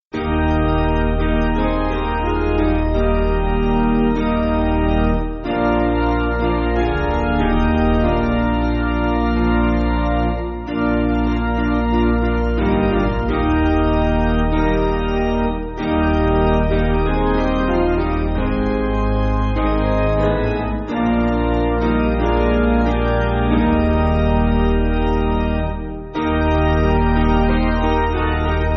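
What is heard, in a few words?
Piano, Free Music Backing Files for Churches; Ere You Left; Perkins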